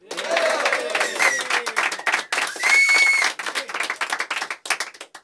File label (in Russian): aplodismenti